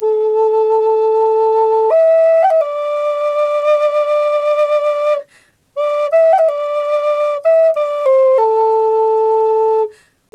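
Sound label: flute, 93 BPM; Big Fish Audio - Didgeridoo and Other Primitive Instruments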